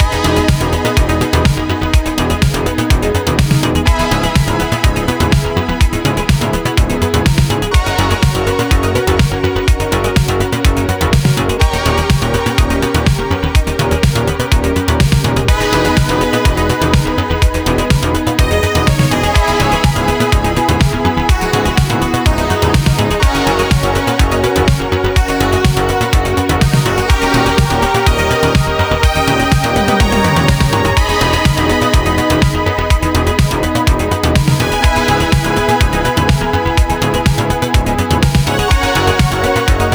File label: Music Wise The Wise Boss 7 Loopable 124